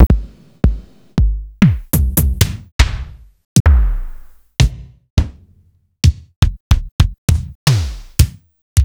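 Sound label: Roland MV8800 drum kicks; DAANZ KICKS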